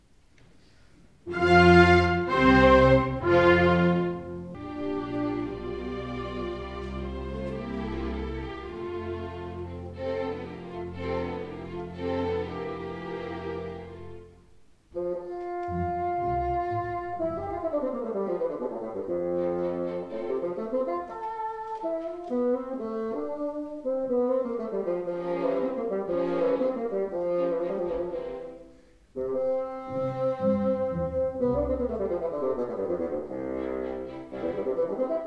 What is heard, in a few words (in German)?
Fagottkonzert F-Dur 1. Satz Ausschnitt; Lindpaintner